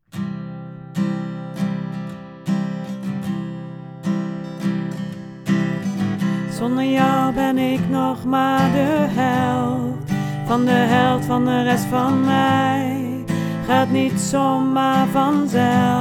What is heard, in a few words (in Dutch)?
lage stem